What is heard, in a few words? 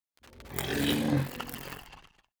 greetings arthopoid special; Stellaris